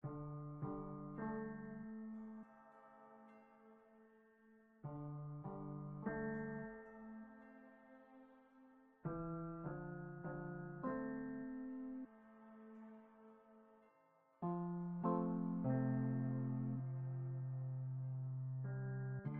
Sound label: calm